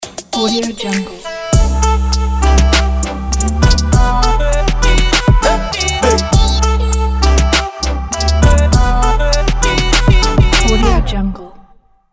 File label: chill trap logo